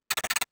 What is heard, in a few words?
drone chirp